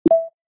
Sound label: message new instant; sound-theme-pika